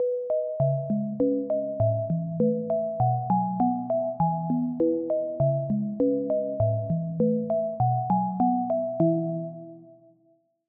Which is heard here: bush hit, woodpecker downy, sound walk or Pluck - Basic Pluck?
Pluck - Basic Pluck